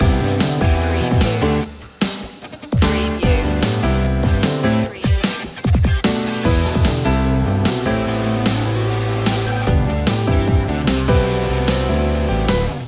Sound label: piano pop loop